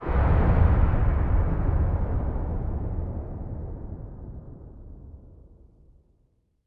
Item Acquisition; Metroid Other M

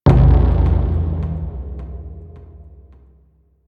UI Stinger Hit; starcraft 2 wings of liberty

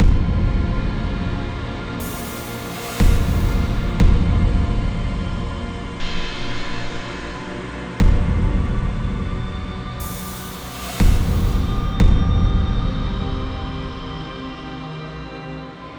Danger Tonal; Insanely Twisted Shadow Planet

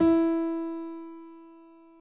Notes E Piano; Scratch

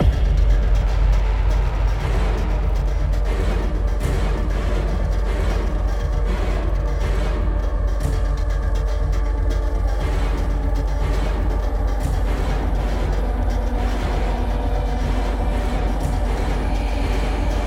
Boss Escape Music

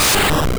Wrap Single; attack moves; pokemon; gen 2; gsc

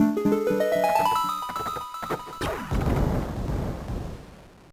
A Door To Next Stage Opens; Wario Land IV

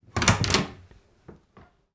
DOOR Wood Bedroom Open; mono; Don't Be Afraid The First Toy

